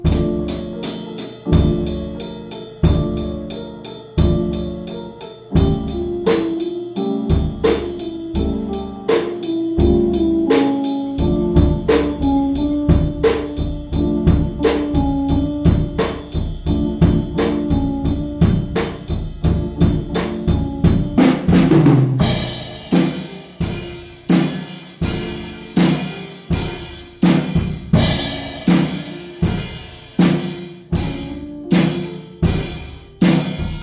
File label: jam